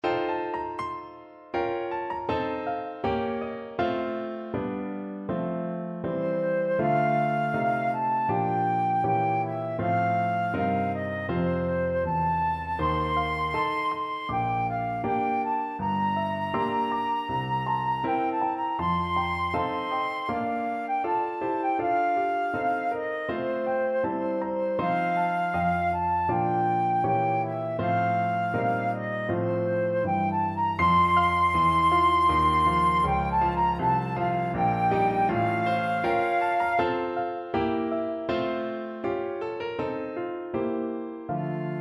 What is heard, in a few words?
verdant braes, flute